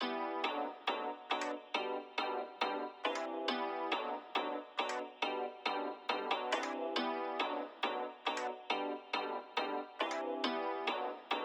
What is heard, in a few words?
Pad